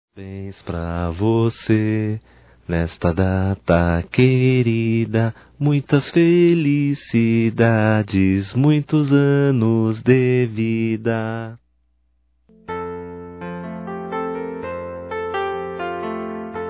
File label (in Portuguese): CANTANDO PARABÉNS; Telemensagens 2017; Aniversários; Aniversários diversos